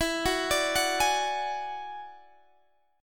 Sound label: Gb 7th Suspended 2nd Sharp 5th E